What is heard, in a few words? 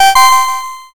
notify